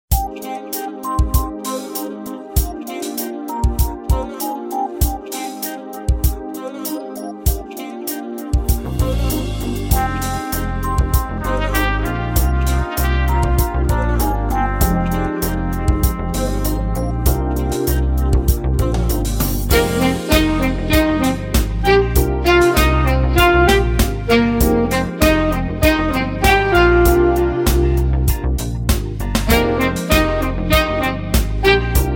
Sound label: Medley Sax